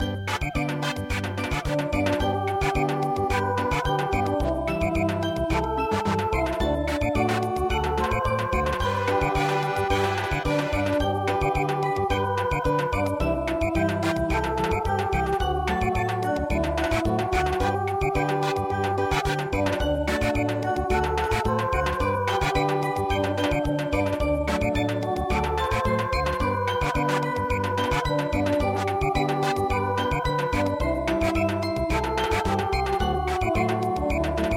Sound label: Samba; foggy foggy dew